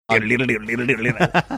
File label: singing portion of song